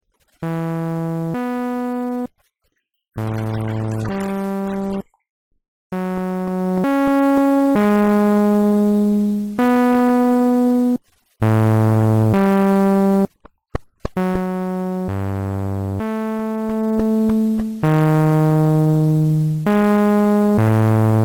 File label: Music; Petscop7; Quitter